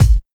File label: rich mid range steel kick drum sample a key